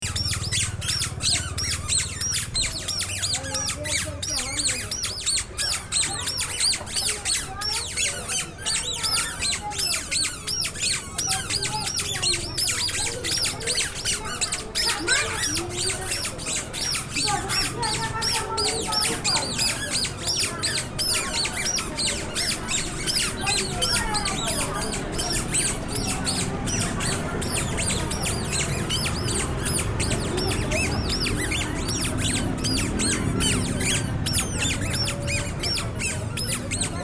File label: squeaky shoes